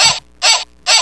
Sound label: dog